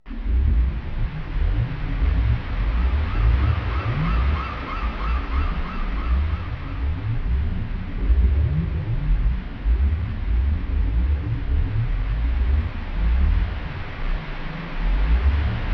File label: Beach Ambience; Donkey Kong 64